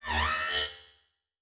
Multimedia Internet CD-Rom Flash Pop Up Electronic